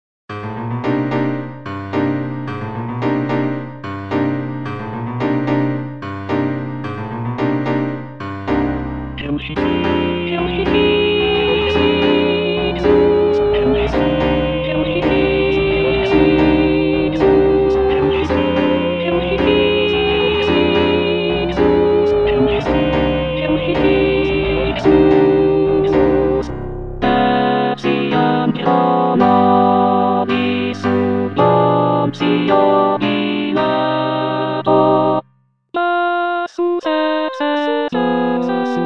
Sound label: Crucifixus Alt